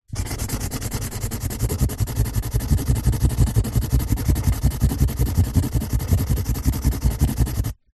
office pen felt tip scribble scrub out on empty cardboard box